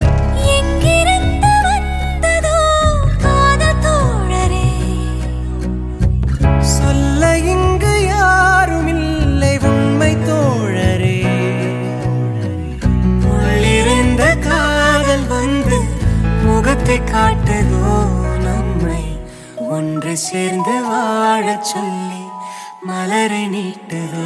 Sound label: Rain Version Love Ringtone